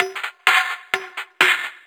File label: FX Loop 128 BPM, House